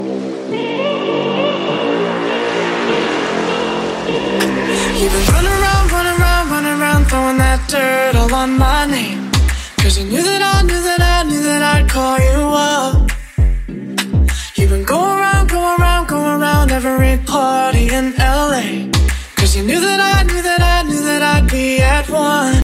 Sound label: remix ringtone